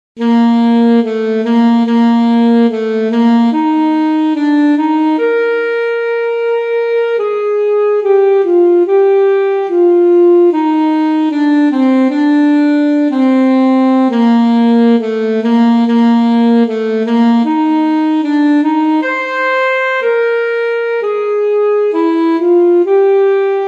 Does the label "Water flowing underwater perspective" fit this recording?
No